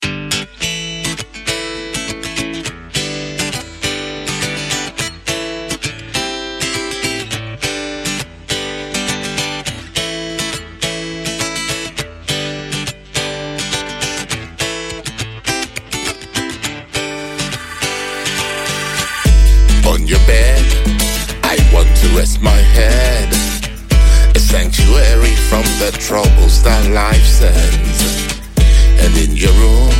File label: Riff